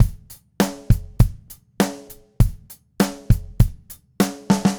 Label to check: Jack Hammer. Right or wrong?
Wrong